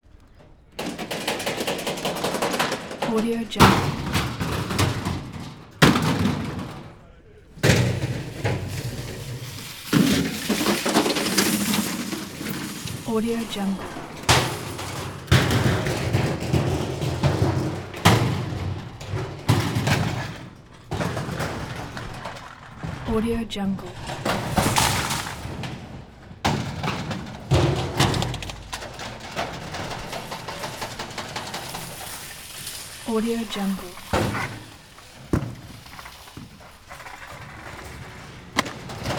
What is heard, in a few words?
trash cans bang turn over scatter trash